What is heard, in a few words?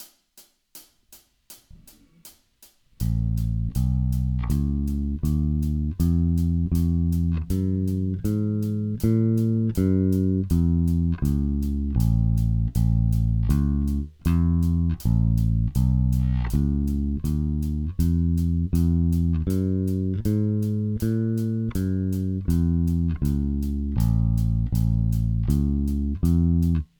walking bass